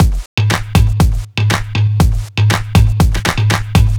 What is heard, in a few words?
120 No Hats, Drum Loops; Abracadabra; 120bpm; Nu Dance Electro